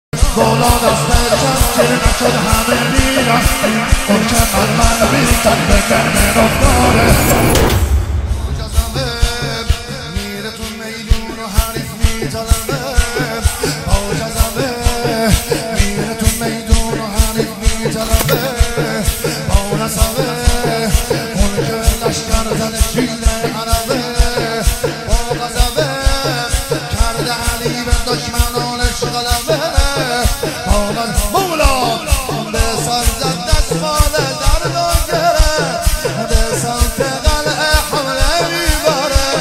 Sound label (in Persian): مداحی